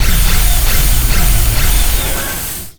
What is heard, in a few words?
valkaryne turn right; Quake 4 Alpha; id software; strogg